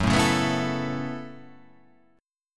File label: F sharp Minor 11th, guitar